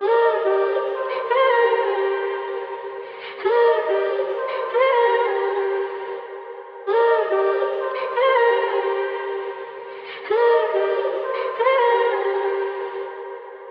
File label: Vocal Loop - 140 BPM F Min; Different Time; Cymatics; Mystery Pack Vol 4; Vocal Loops